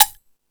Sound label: Percussion FX, E-MU Pro-Cussion; E-MU Pro-Cussion-1